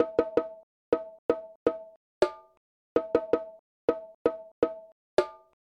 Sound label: bongos and congas; percussion